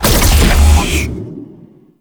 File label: Race Gate Hit; Warframe; Tau; Circles Of Hell